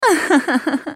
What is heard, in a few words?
spell cast effort; AhriSkin04